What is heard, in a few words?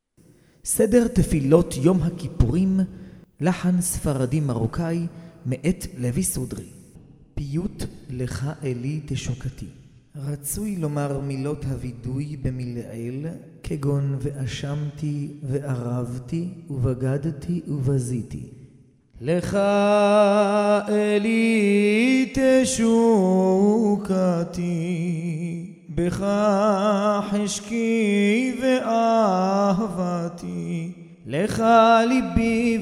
recorded prayer yom kippur morocco